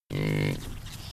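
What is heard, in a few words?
calm roar of a buffalo in the wild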